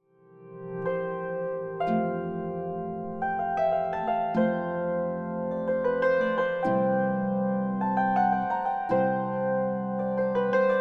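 Menuett aus der Knaffl-Handschrift, auf Harfe & Hackbrett; advent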